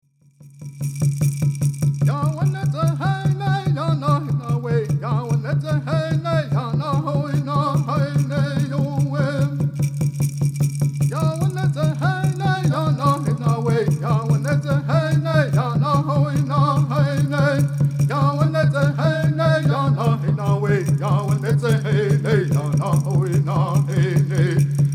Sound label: Native American Church Song